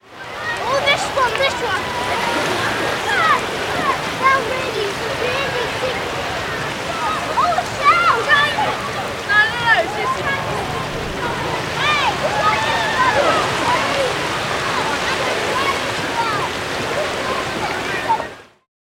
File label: ambience beach summer people; sound effects one